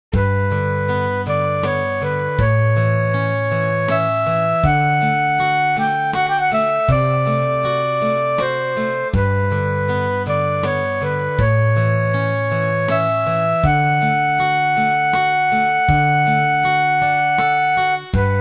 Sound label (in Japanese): kaettekita furusato, bgm